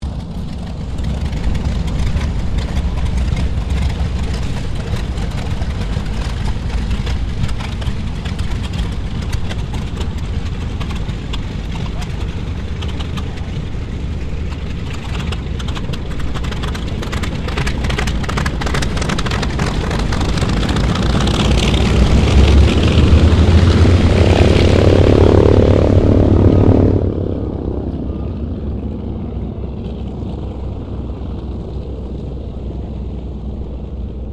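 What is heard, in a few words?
AIRCRAFT LOCKHEED L-1049 SUPER CONSTELLATION TAXI; 1955; Connie, Super Constellation; Airplanes (Sound Effects:Vehicles)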